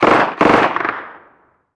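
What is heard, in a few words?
gun mini uzi distant; ModernWarfare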